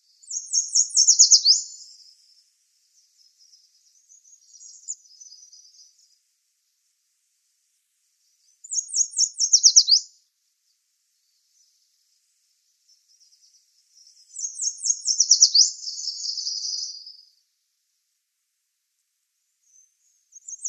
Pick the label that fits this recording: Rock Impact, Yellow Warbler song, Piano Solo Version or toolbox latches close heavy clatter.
Yellow Warbler song